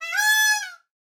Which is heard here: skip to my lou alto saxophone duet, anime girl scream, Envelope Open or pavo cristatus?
pavo cristatus